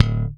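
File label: Bass, short thumb D1; Sampleheads; Will Lee Bass Library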